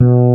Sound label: B4 FRETLESS; E-MU Formula 4000 Series Vol. 1; Hip Hop Nation; Raw Phatt